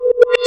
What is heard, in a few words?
Beat